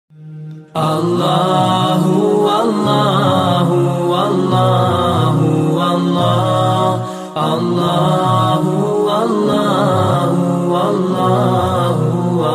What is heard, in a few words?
allah hu allah islamic ringtone